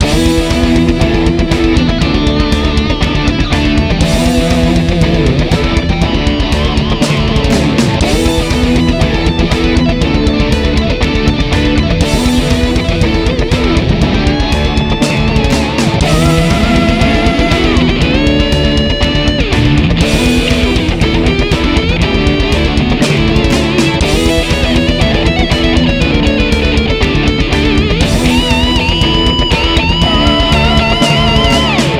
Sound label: 80s heavy metal